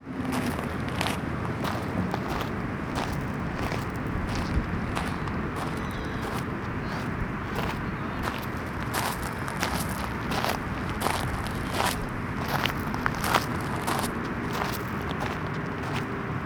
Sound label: Gravel Footsteps; Menil Drawing Institute; Houston